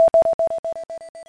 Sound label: BLIP